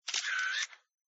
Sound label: camera click; Fly IQ442 Quad Miracle 2